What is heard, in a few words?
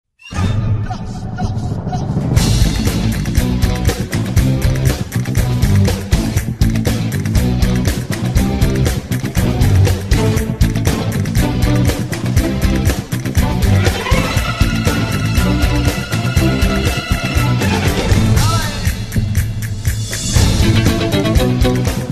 Pasodoble